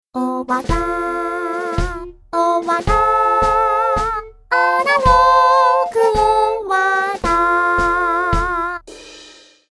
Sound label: analog